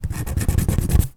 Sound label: foley scratchcard scratching off covering short